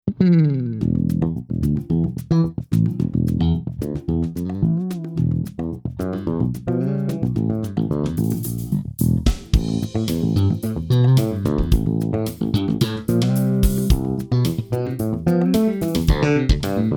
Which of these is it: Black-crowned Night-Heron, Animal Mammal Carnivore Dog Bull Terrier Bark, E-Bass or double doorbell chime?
E-Bass